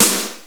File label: SD Power; Drums; TG300B